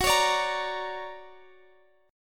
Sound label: D Augmented Major 7th Gb, guitar